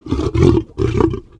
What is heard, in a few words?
Pets Lion Happy; creatures pets; Lego